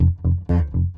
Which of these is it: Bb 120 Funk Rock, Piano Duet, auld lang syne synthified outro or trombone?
Bb 120 Funk Rock